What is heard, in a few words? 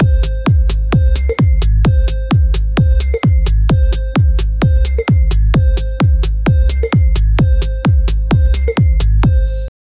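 upbeat electronic background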